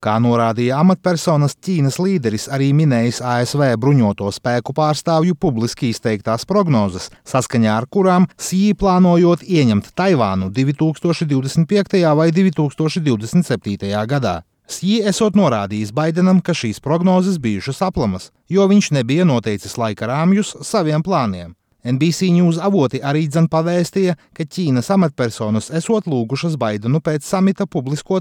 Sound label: moan